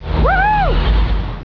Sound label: woohoo